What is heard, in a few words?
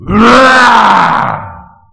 Type F Atk; WarRock